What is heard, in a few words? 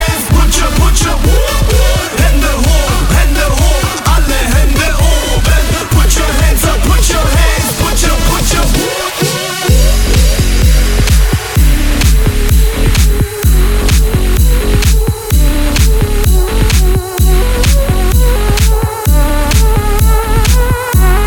jingle; hitparty